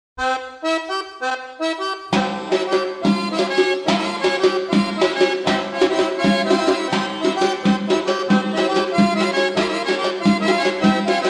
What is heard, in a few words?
georgian folk dances kintauri